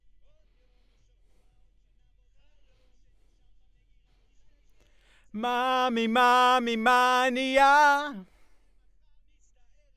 B. Vox

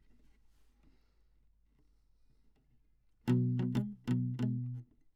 Classic Guitar

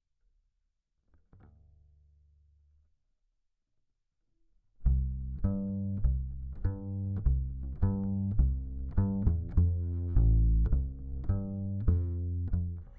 Bass DI

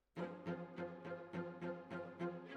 Cellos

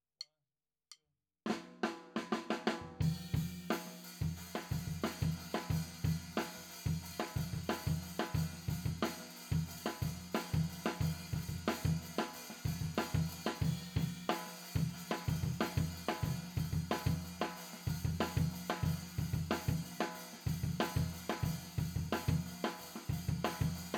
Floor

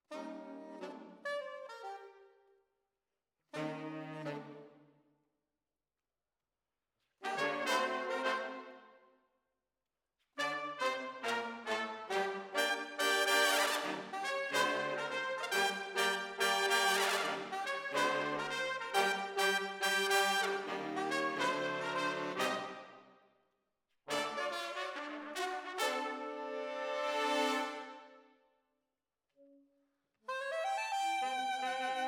ORTF, Jazz for piano

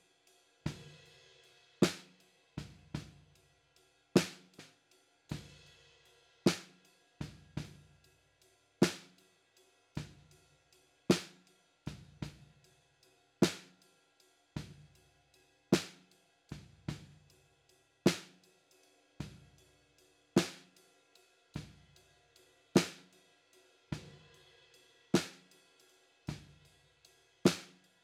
SNR Bot

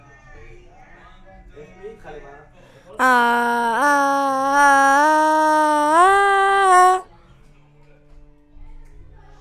VOC Melo

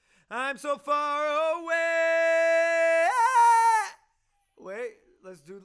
Chorus

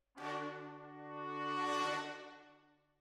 Hall, Jazz for piano

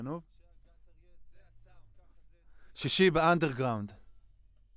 Voc OD

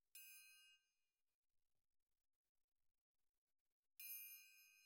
TRIANGLE CLOSE

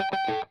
Strum Line

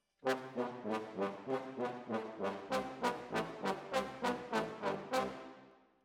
Brass

